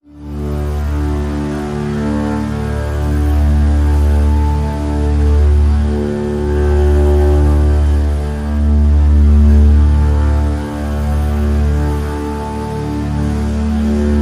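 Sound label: Drone Bowed Bass D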